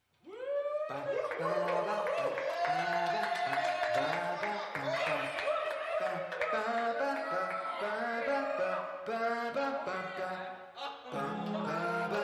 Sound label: Jazz Club Clean